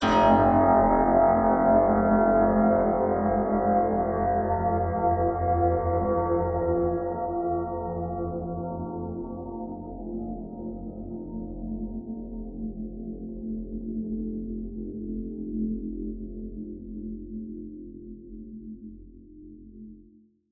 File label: harp bell